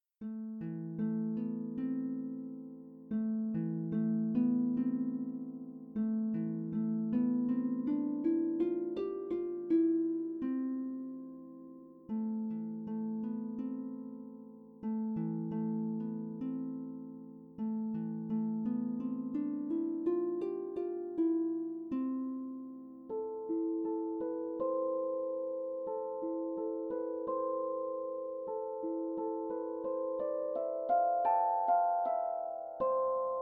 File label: harp pedal; konzert harfe; Pianoteq; Modartt